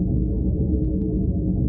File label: pulsing whine